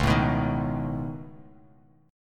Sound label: C sharp 7th Suspended 2nd Sharp 5th, C sharp, down, Bass Standard; strummed; chord